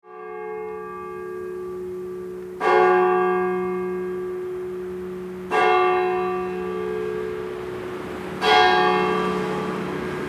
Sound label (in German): Christuskirche Hamburg